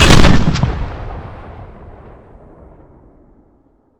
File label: shoot, weapons